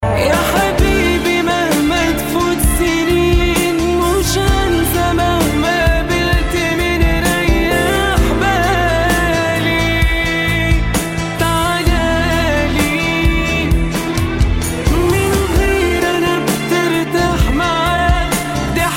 Arabic Sad Song

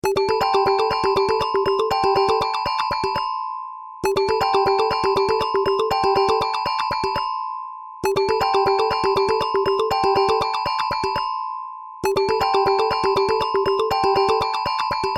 Funky Rain

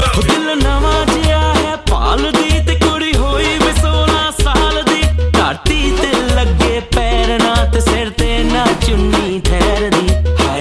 Punjabi Bhangra; Punjabi Bhangraton

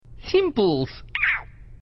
Meerkat Msg Tone

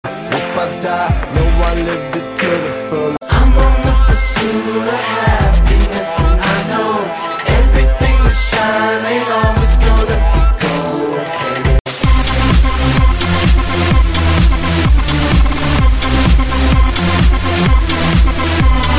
CHORUS

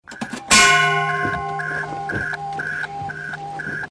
bell; Ladder 49

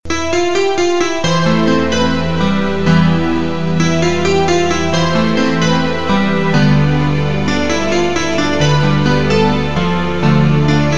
Romance Tone

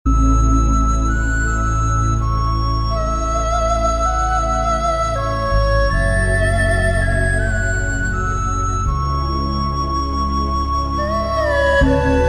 Buddhist Tune

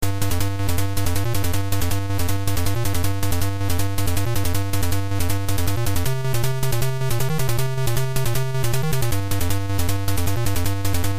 Kung-fu Master Music

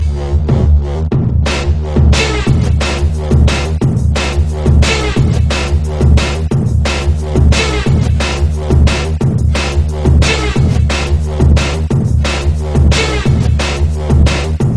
Drum And Bass

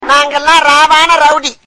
Sms

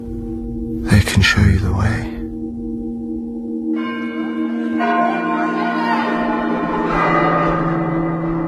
Bell Slow; Darko

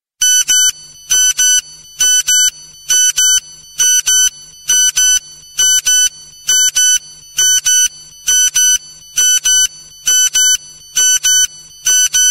Alarm ringtone

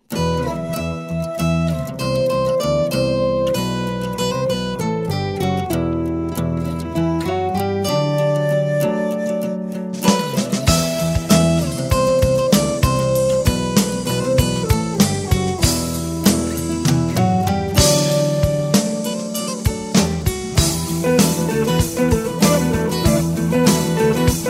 Guitar Tone

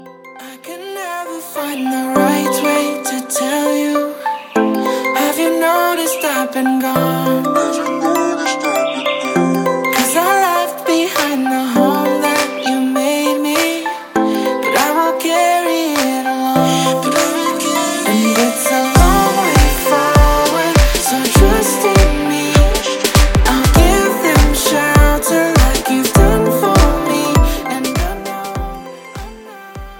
Shelter Remix

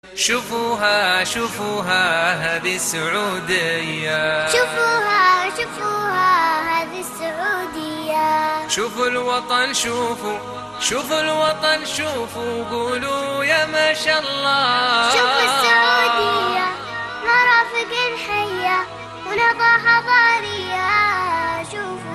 Arabic Ringtone

